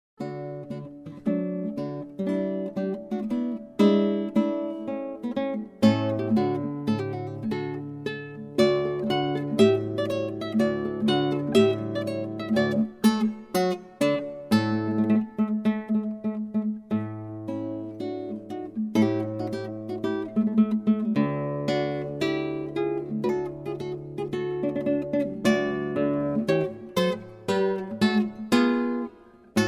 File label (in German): Marsch